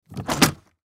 Briefcase Metal Open; Miscellaneous Office; Blastwave

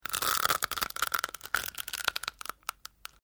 Dry Brittle Snapping Long

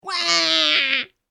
Pretend Baby Crying Sound Comedy Wah; Funny Sound Sound Effects; human sound effect

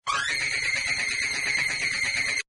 probe, Tech Sounds

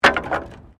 Wood Fall

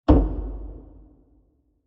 Low Wood Impact; Low Impacts; Crafted Signals

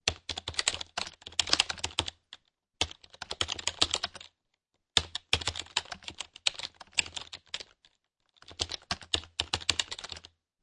Computer Keyboard Typing

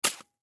Snow Flashlight Drop; Weather; Snow Sound Effects; Part of Snow (Sound Effects:Weather); Blastwave

